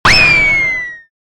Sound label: stomp; freak fortress 2; hero cookie